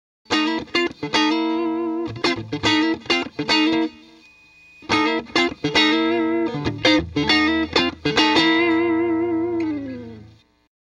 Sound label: m75 tele neck clean jtm45